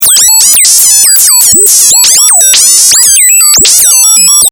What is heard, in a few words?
fm synths